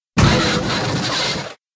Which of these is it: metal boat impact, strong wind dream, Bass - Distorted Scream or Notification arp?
metal boat impact